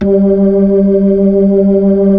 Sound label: B-3, Keyboards of The 60's and 70's; Club Xfade